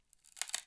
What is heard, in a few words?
Palette Tear, Wooden Palette